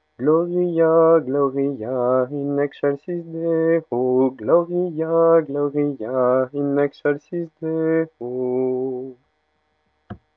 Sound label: gloria alto; mariage